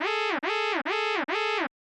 Heat Warning; super mario excitebike

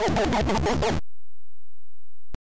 Jester Laugh; castle of no escape 2

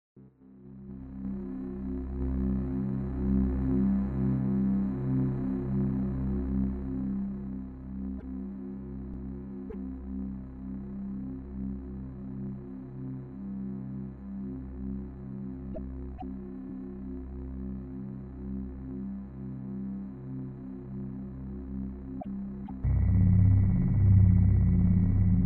Station Ambience; the white chamber original soundtrack 2006

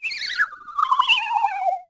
Plane; Lego My Style Kindergarden macOS Windows 2000

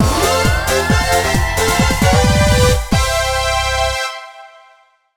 Monkey Race Win; super monkey ball adventure

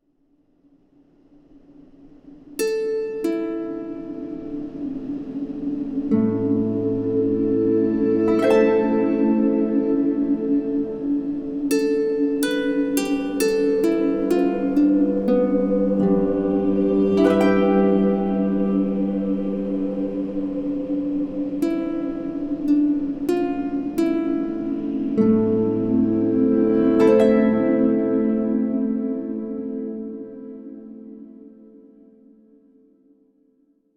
Transition; Ozymandias

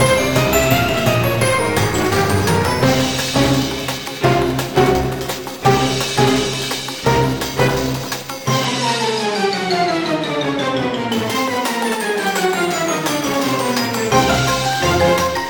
ghost closer Loop; bail or jail; obakeidoro; windows

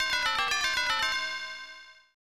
Victory Chimes; Shin Megami Tensei if...; SNES

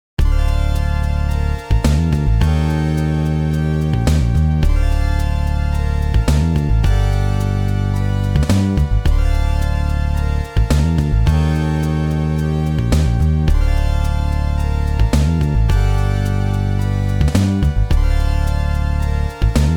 mission complete bgm; Elevator Action Returns

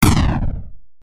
big gun; Robot Wants Ice Cream

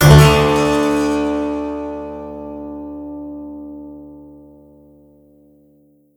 Stage Lost

